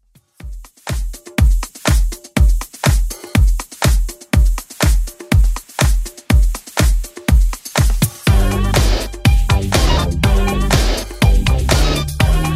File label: 80s Club Edit 122bpm